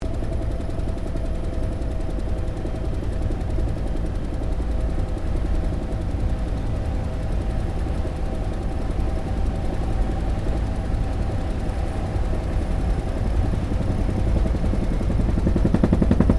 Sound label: HELICOPTER BELL UH1 IROQUIOS FLYING INTERIOR; RAN UH-1 Iroguios; Helicopters (Sound Effects:Vehicles)